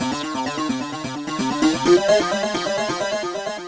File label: synth arp; Electrocution